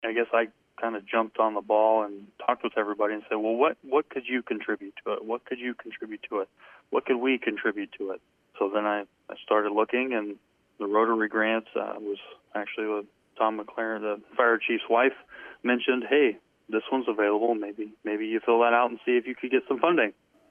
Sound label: Drone